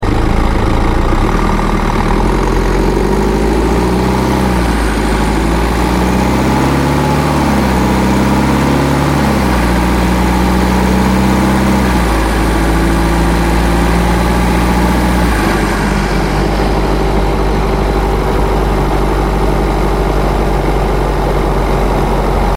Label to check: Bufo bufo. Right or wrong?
Wrong